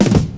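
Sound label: drum roll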